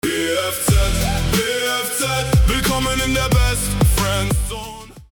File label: bfz rap